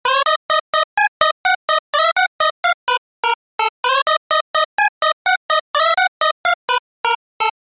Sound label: LoFi; PatchFactory